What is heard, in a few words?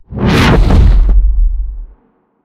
shell passby; War Thunder